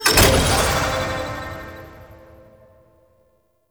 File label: Hydraulus Puzzle Success; Warframe; Bard Quest; Bard Quest Music Hydraulus